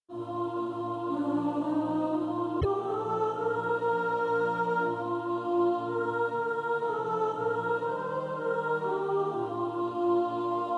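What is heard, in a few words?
soprano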